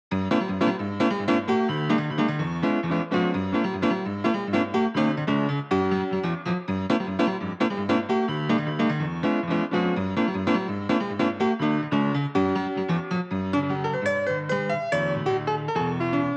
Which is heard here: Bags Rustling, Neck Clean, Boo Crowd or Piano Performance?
Piano Performance